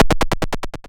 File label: Cry